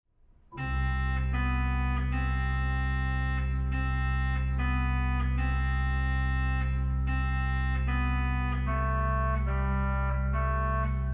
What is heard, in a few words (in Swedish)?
Andra flugan, orgel; Spela orgel